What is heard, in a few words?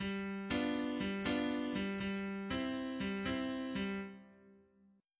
swing